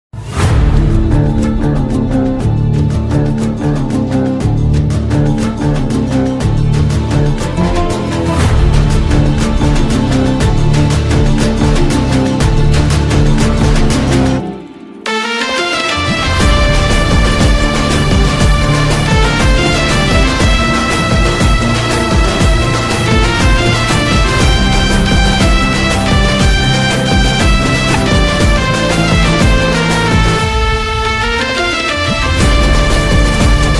Instrumental

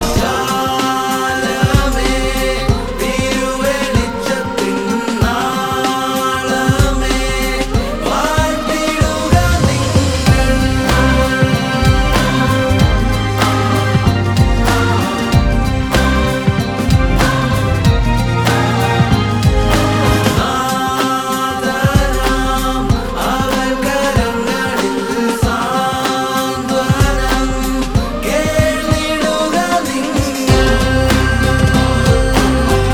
Male Voice Ringtone

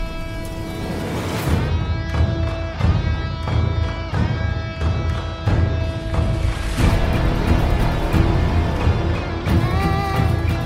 Bus Attack BGM; Karnan

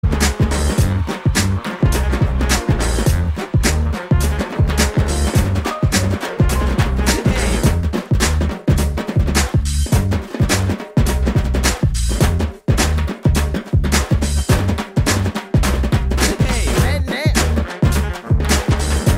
drums beats

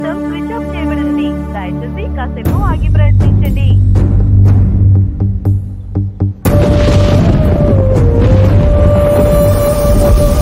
Sad BGM

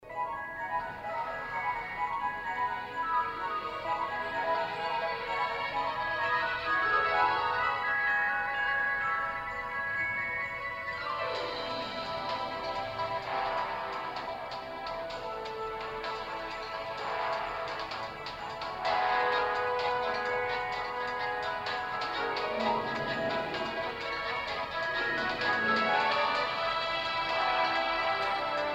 Winter Theme Music; Battlegrounds Mobile India

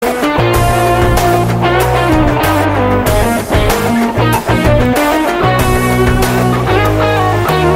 Guitar BGM

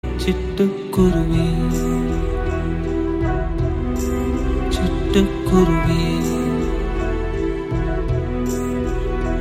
Sad Ringtone

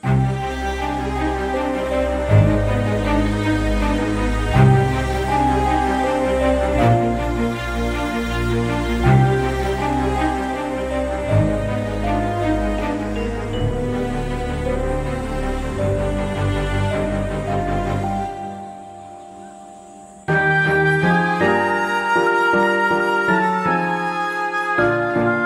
Sad BGM; Nenjam Marappathillai

